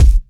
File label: clear kick drum b key